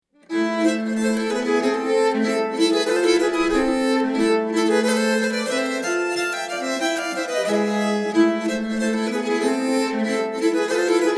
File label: Polonas, nyckelharpa; efter Blomgren